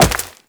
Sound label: Wall Jump Rock; Footsteps; WallRun; Warframe; Characters; Tenno